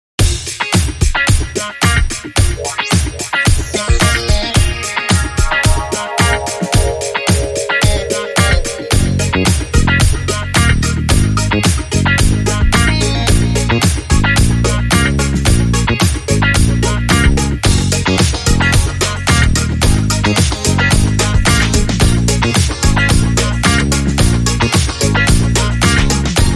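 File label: Blow My Flute Rhythm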